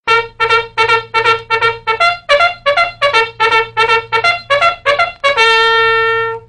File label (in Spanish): Corneta Militar